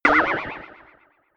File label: notification; samsung Aleart